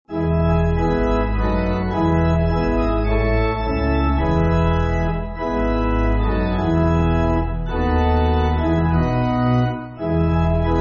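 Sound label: Pipe Organ, Music Backing Files for Churches; Voice Of Praise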